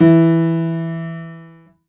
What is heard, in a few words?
Piano, Harmonic